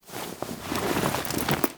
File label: Foley Ship Move; Narmer Outpost End; Drifter